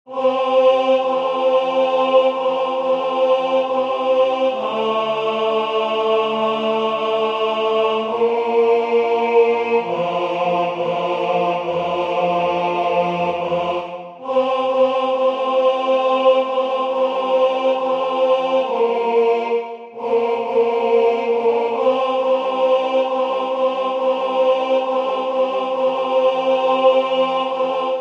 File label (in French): Je vous salue Marie tenor